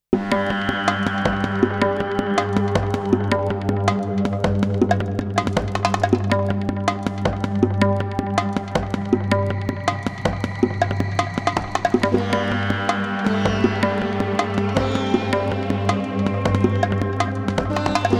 raga beat

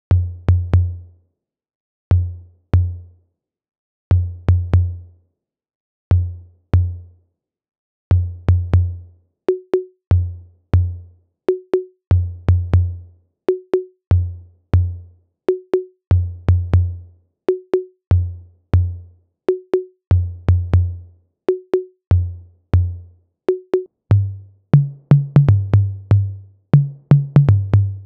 Roland TR 08 Tom Conga

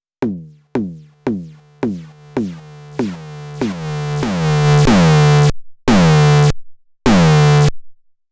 Elektron Digitakt Bitreduction

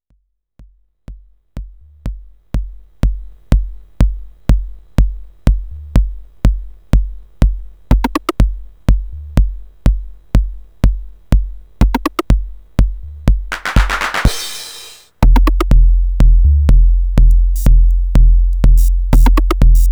uno drum

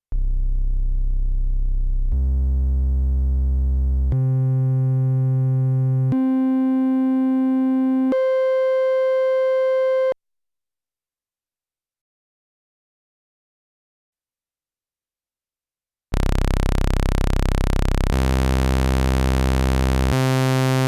waveforms single; toraiz

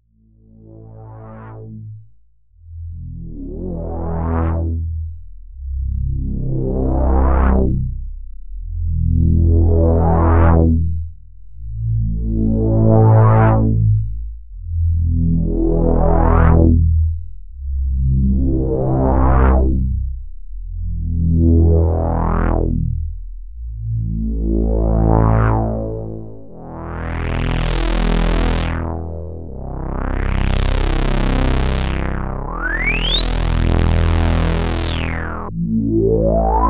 Roland SH 01A Bass

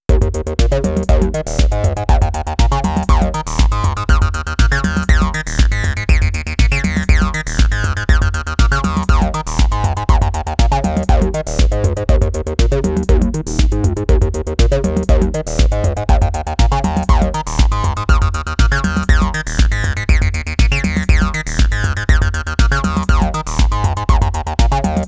Acid Pattern

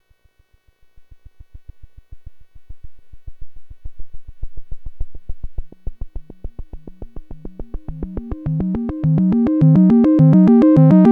Arp VAs Osc Mod

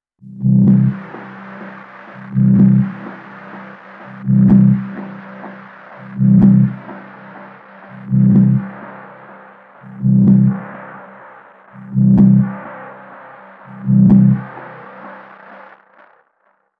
Synth Pad Lo Fi Delay; Timeline

